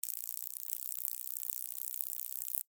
Insect Wings Fly, Steady, Bug; Animal; Wing Sound Effects; Animals

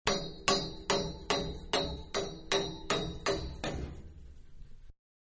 Hammer Large On Metal Pipe; Hammers (Sound Effects:Tools); Manhattan Production Music

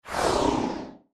Sci Fi Spaceship; Door Close; Spacecraft; Blastwave

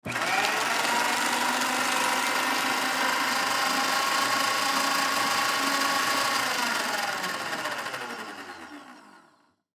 Paper Shred Single Sheet Of Paper; Office; Blastwave

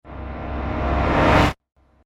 Whoosh Imminent Danger; whoosh sound effect

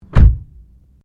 CAR TOYOTA COROLLA DOOR CLOSE INTERIOR, hatchback

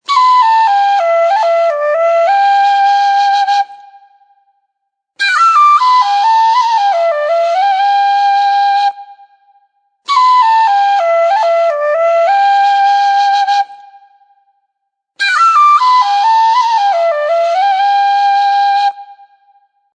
Tin Flute; TinFlute 2 Ringtone; Music Ringtone

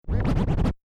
Turntable Scratching; DJ (Sound Effects:Musical)

